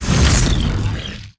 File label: strider leg stretch; Strider Remake